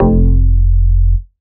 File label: Bass Shot F